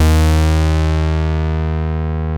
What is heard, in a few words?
TEISCO, BASSES